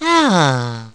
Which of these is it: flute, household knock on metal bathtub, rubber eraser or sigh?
sigh